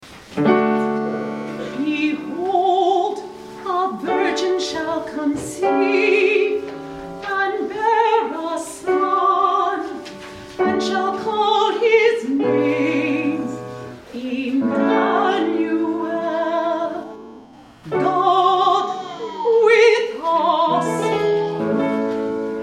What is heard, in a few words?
Behold! A virgin shall conceive (alto); Messiah